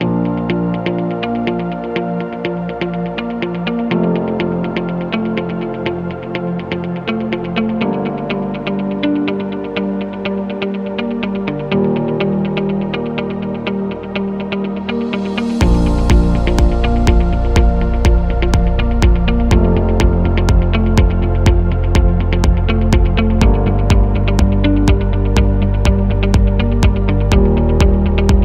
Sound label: Corporate Upbeat